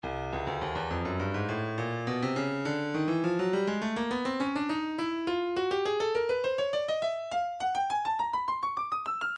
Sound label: breakbeat midi, 103 bpm; maximus a; Stylus Breakbeat Midi Files